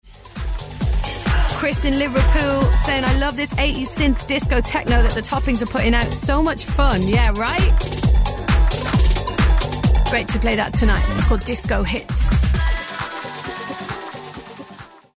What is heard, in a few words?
disco techno